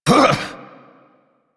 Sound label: Injured Grunt